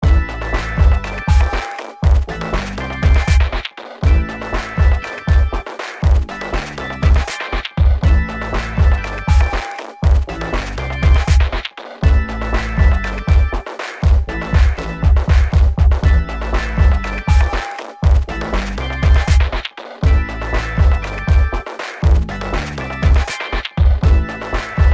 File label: drumloops 16 Bars